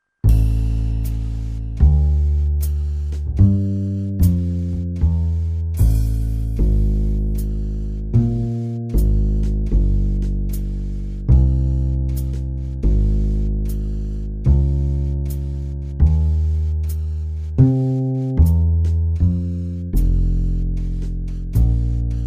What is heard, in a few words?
Backing, jazz